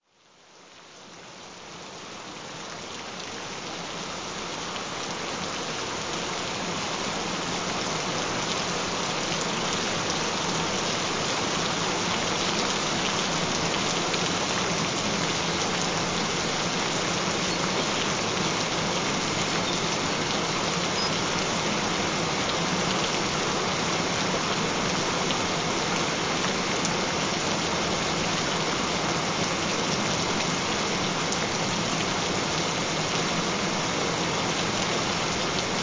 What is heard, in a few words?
Gentle Rain Sounds; Get Rid of Varicose Veins